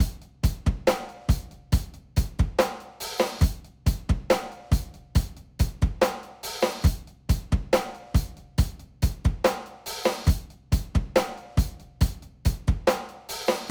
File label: rock